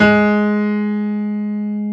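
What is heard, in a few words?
piano G#2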